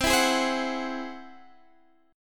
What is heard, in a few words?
Ab Augmented 7th C, guitar